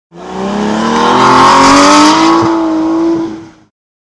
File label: Drive Away; V12 Vantage